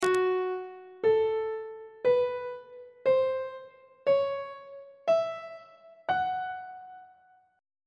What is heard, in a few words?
f sharp blues scale on treble clef